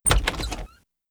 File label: Door Open; Lucius III; pc linux